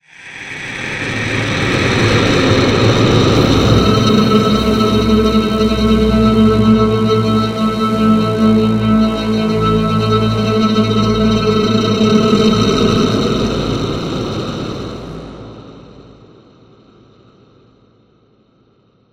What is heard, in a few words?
ending, music; a5 ending alyx